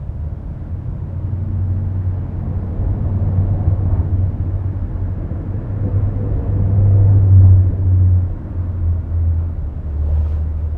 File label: car over tunnel; Cumbria Remixed Sample Pack